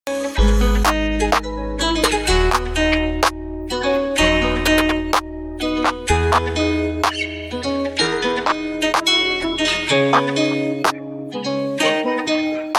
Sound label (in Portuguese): trap de quebrada instrumental